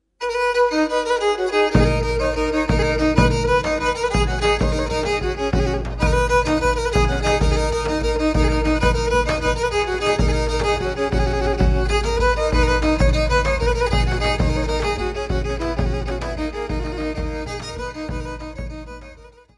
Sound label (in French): Syrto Bafra, Grece